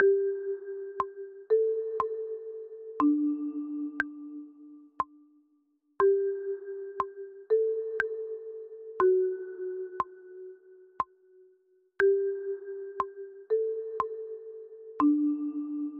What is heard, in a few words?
marimba